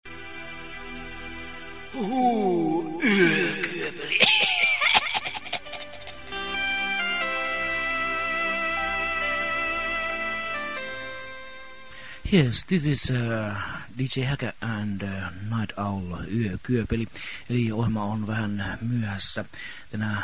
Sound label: haka